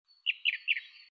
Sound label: Bird